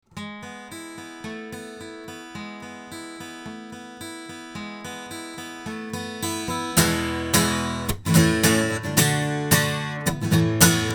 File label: Oktava MC012 cardioid guitar